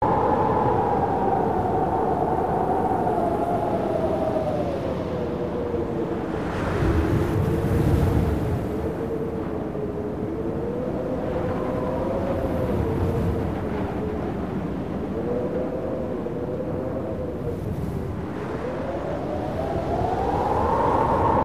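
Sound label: Wind Drone Gust Draft Loop; the unfinished swan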